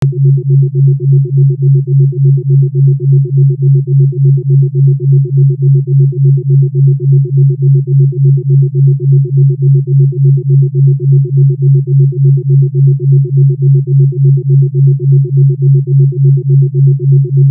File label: Anti Anxiety Binaural